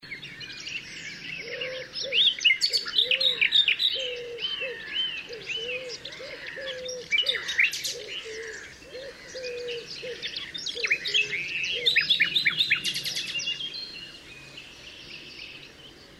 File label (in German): Nachtigall; Altmuehlsee WUG; Susanne Rieck